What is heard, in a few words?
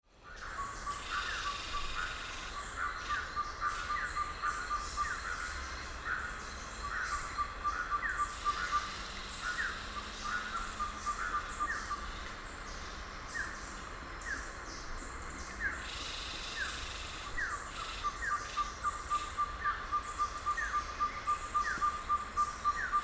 Ferruginous Pygmy-Owl